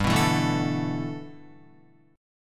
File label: G 6th Add 9th G Guitar, chord; G6add9 strummed